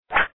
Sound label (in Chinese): 剑; Samurai vs Ninjas